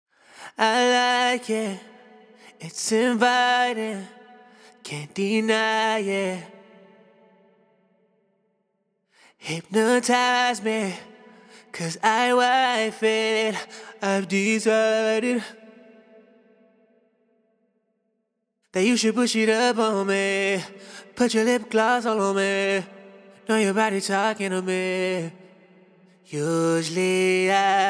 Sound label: Acapella - 105 BPM E Min Lead (Wet); Vocal Loops; Acapellas; Cymatics; ANALOG EVOLUTION Beta; Change Your Mind